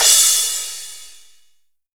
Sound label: wash, Cymbals